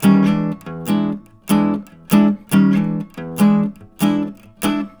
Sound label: Blues Guitar Samples, riffs; ILIO; Fretworks